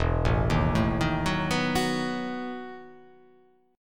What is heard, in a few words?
E Major 7th Suspended 2nd Suspended 4th